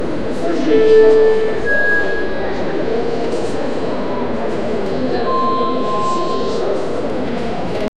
airport attention tone

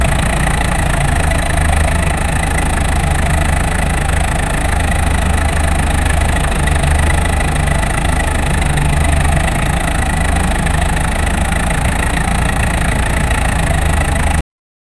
Nissan Skyline engine; r34; automotive

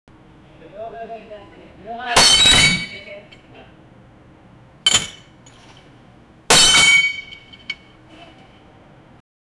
pizza slice monster; emerging

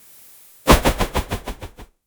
realistic dash effect, fighting game

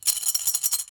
indoor

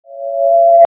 a futuristic computer

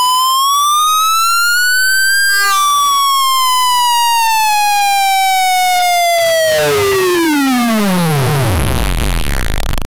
double swoosh

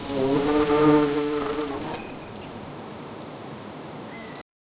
the distant rumble of thu

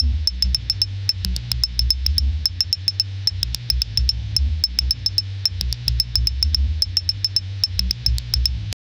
church organ loop 230bpm

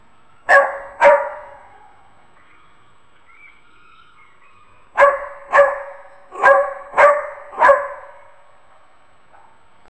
the dogs worried barking

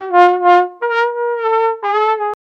iconic sad trombone wah w

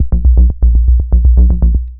deep and groovy bassline, analog

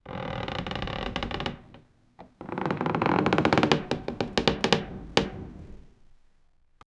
very old attic footsteps

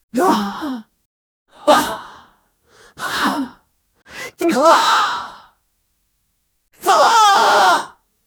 aggressive demonic female; human vocalizations

